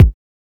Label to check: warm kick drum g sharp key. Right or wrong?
Right